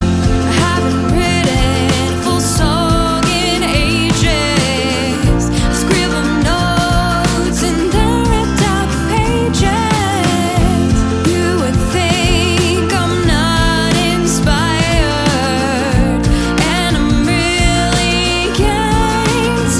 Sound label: Happy Song